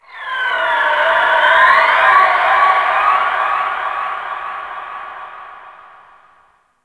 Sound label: Ocean Dolphin; Warframe; Grineer Ocean